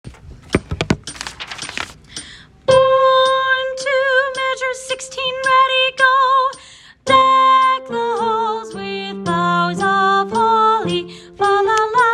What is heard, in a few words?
soprano